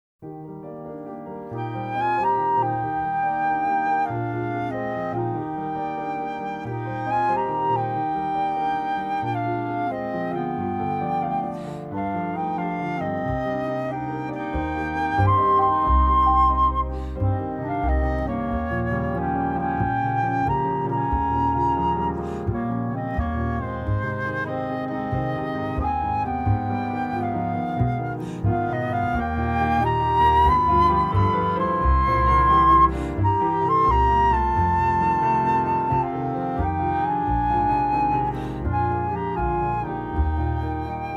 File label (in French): Intermede instrumental